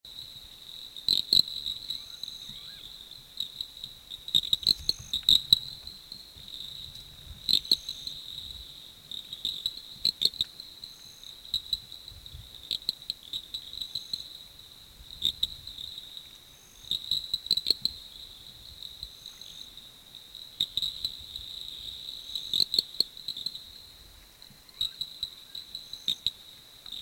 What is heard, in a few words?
Aegotheles Cristatus; Australian Owlet-Nightjar; Family - Aegothelidae (Owlet-Nightjars); Call